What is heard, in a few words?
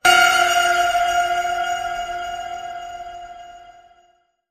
Spine Chilling Ping